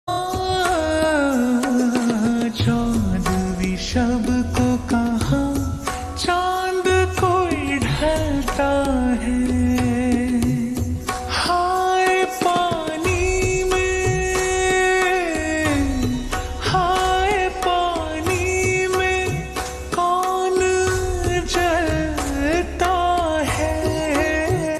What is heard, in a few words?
Ringtone slowed reverb